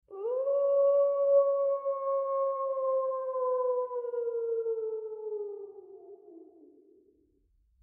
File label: wolf howl; wildlife; SPT Battle Ambience